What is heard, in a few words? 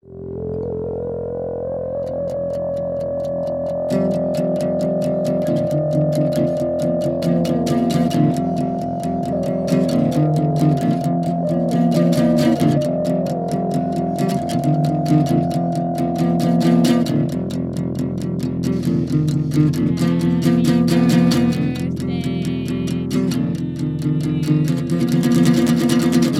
birthday song